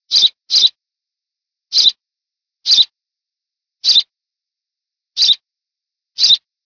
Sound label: passer domesticus